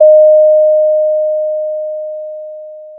Sound of a doorbell

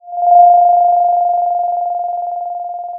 Sound of a phone ringing

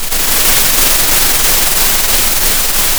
pop, Sound of a thunderstorm

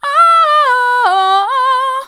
ooh hard, vocal

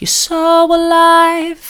soft, vocal

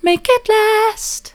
make it last soft, vocal

